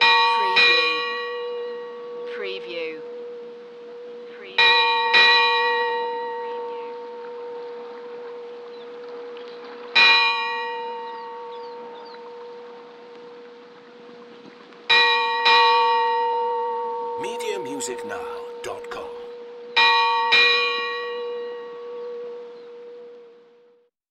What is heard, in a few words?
Bell Sea Buoy